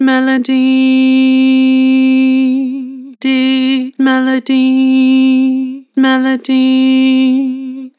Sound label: Vibrato Melody, Vocals; Harmor